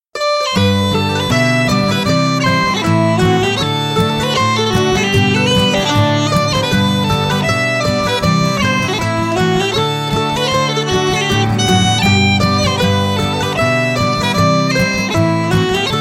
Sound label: Barndances